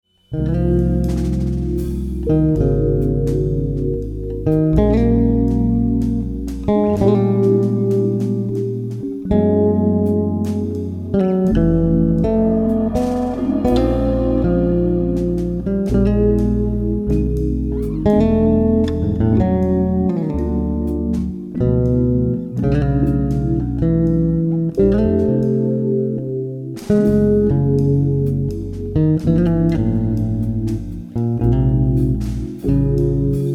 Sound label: Waltz